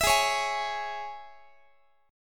Ab Suspended 4th Sharp 5th Guitar; strummed; Absus4#5; x,x,19,18,17,19

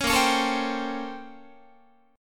Bb Minor Major 13th Bb, Guitar; BbmM13; strummed; JGuitar

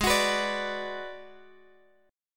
Ab Minor 7th Flat 5th Ab, down, Guitar, DADGAD

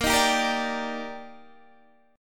Eb Major 7th Bb

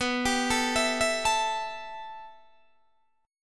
B 7th Suspended 4th Sharp 5th, Guitar, Open D

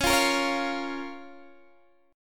C sharp 6th C sharp down Guitar DADGAD

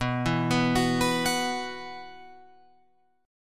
B Suspended 4th, Guitar, Open F; 11,11,11,13,14,13; arpeggiated; JGuitar

